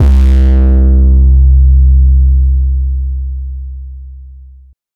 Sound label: hard 808 bass one shot